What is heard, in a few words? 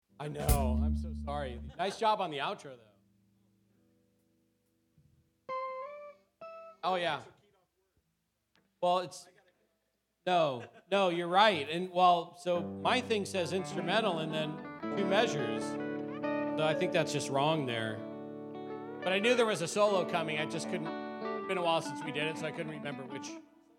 Vocals